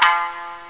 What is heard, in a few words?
Synthesizer Samisen; konami